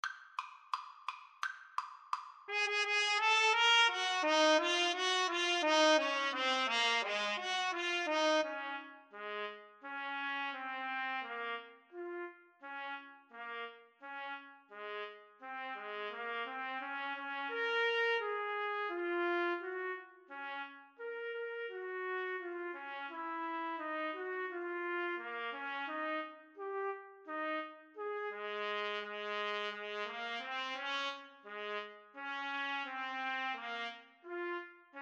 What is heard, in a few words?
trumpet duet